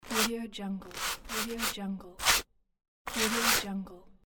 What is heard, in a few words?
brush; mrsoundfx